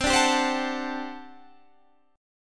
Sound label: G Major 7th Suspended 2nd Suspended 4th C